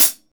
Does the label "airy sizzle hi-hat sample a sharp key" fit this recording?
Yes